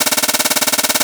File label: Brush Snare